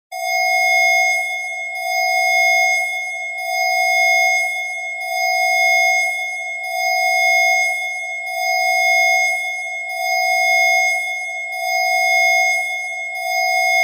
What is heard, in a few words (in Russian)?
avarijnyj signal